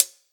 Clear Hat Sample A sharp, Royality free foot cymbal tuned to the A# note. Loudest frequency: 8041Hz